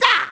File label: Bokoblin Slash